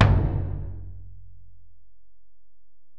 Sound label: Tom, Alesis DM-PRO